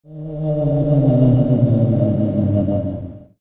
CARTOON

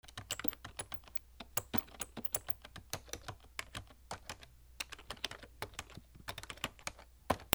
OFFICE

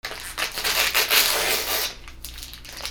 PAPER

